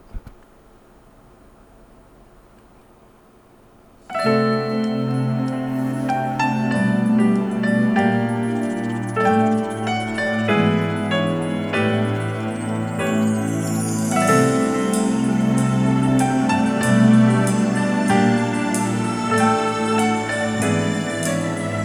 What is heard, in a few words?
SUB -20dB